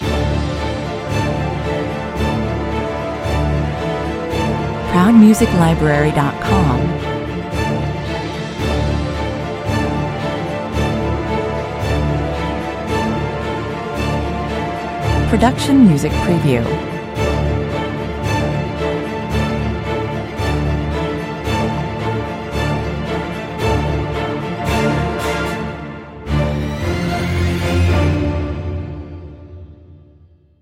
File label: no choir no perc